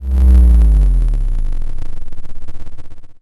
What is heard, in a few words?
fly over